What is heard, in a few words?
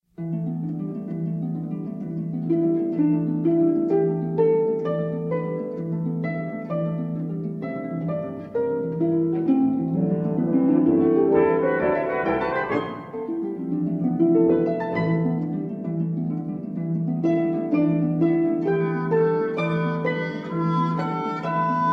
Concertino for Harp and Brass Quintet, Allegretto